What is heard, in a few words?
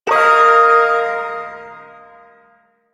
Ersatz Shawzin Blues Chord; Music; Chords